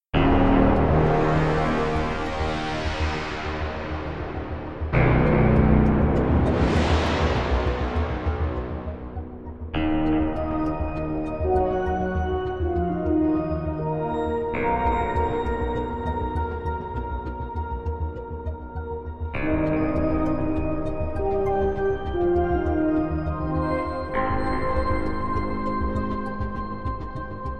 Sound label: Fast Intense Synth